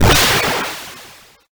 knockout; footyzag

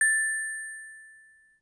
get star; rabbit samurai 2

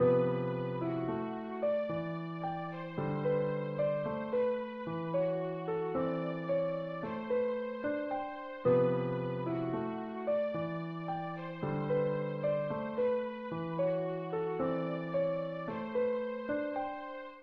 music; node3

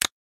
button; stack jump

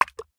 moving; merge fish

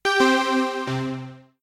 gameover; candy blocks

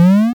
jump; dinosaur run

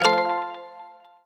match; match drop

